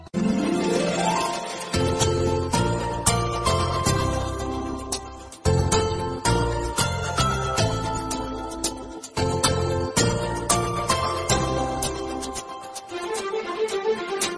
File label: New Mobile Ringtone; ringtones